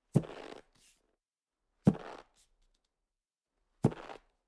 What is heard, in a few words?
Getting Stuck Sound Effects